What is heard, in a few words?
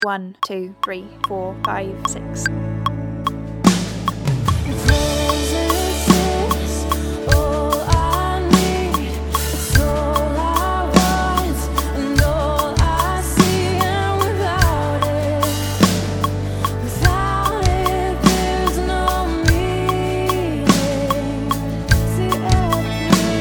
drums